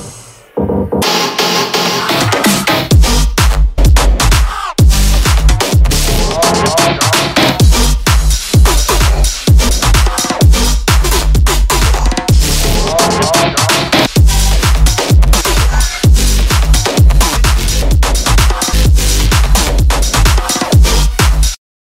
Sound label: EDM Party Electro House